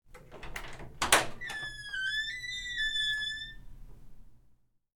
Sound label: Door Open With Squeak